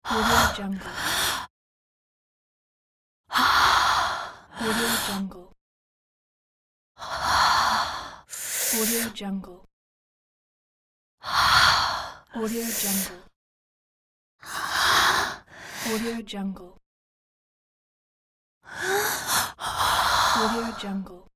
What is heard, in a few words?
woman voice pleasure panting